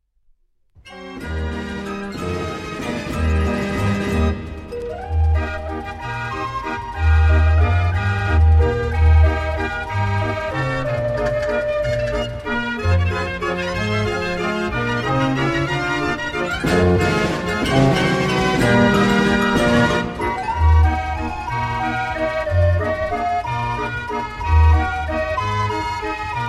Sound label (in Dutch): Het Fiere Schooiershart, draaiorgel; de broodvechter